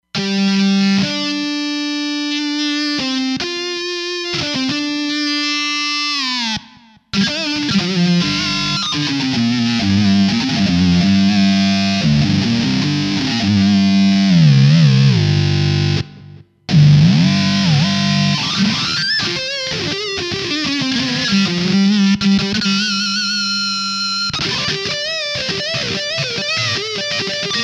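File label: Loud Guitar, Roland Fantom XR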